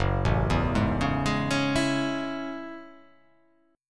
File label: F sharp Minor 9th F sharp